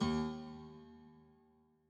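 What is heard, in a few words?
b piano2, note D sharp octave 4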